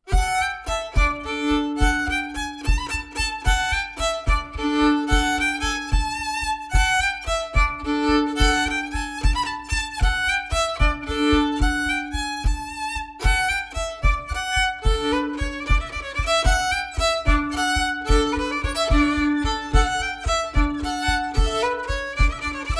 Bourree d Auvergne

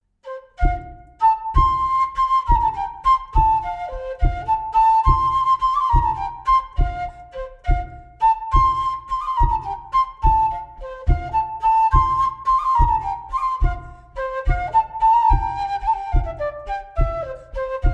bourree; Coatrieux

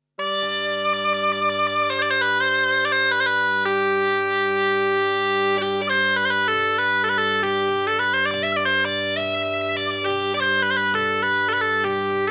Valse a la chabrette

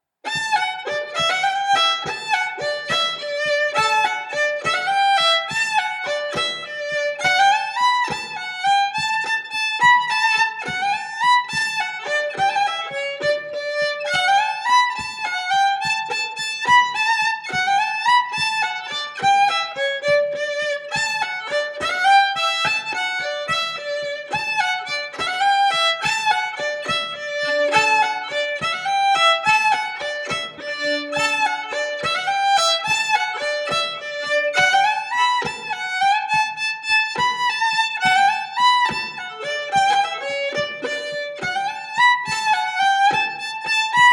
Montagnarde; Recueil Hainl 2; Montagnarde de Thiers

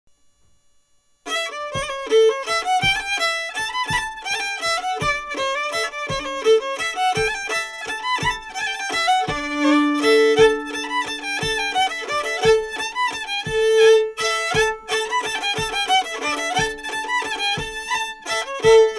Mazurka; d'après L Peyrat

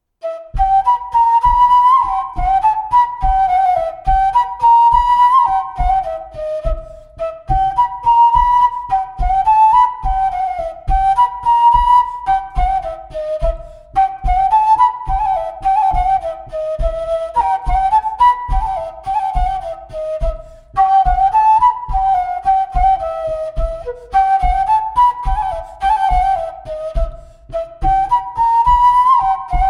Montagnarde; Recueil De Raoulx

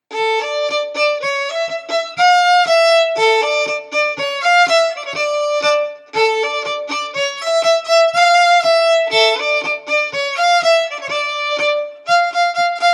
Bourree

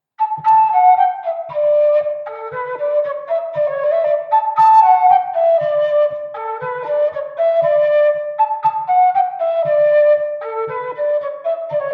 Bourree; Recueil De Raoulx

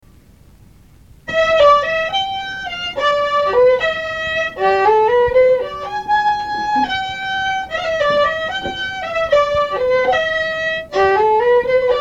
valse

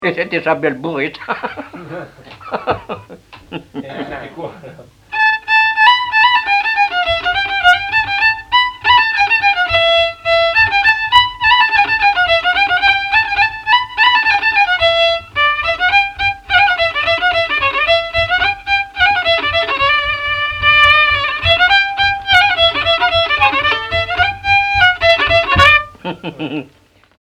Bourrée; Bourrée du voisin de La Morangie

